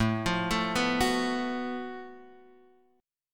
F sharp Minor Major 7th Double Flat 5th A

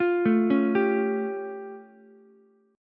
A Augmented 7th A, Ukulele; arpeggiated; JGuitar

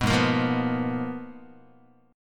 Ab Minor 6th Add 9th, Ab, Guitar; strummed; JGuitar

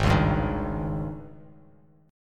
G sharp Major 7th Suspended 4th, Bass Standard; 4,4,5,8; JGuitar

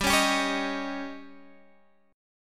Db Suspended 2nd Flat 5th

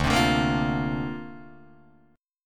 D-Minor 7th Sharp 5th D Guitar DADGAD, chord

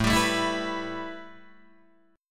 A 7th Suspended 2nd A Guitar Open D, chord

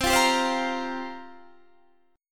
F Suspended 2nd Suspended 4th C

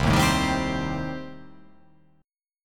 C Minor 6th C

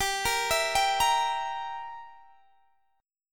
G Minor 6th, Guitar Open D

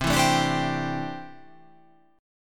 C 6th Add 9th C down

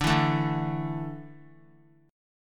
D Suspended 2nd D down; strummed

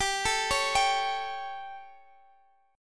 G Suspended 2nd Flat 5th G Guitar DADGAD; Gsus2b5; arpeggiated; x,x,17,14,16,17

